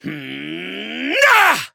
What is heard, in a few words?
battle ring; dqxi